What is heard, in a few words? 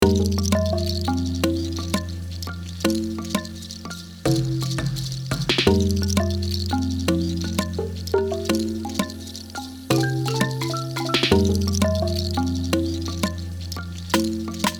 daily vinyl mix